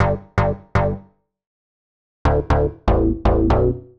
Digi Bass (120BPM), retro house

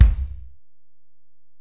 Ac BD, Alesis DM-PRO; 300 Drum Machines

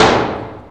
Clank, Industrial; Sonic Foundry (Sony Creative Software)

